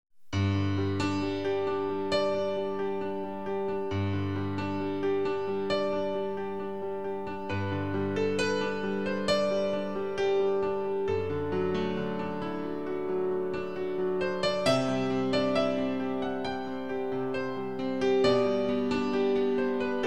Instrumental

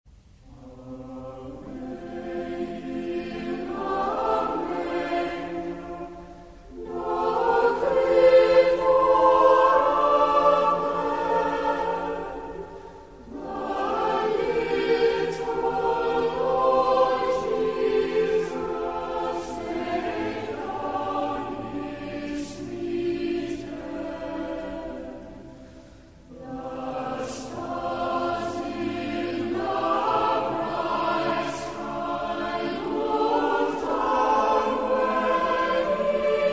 Cathedral Choir, Away In A Manger

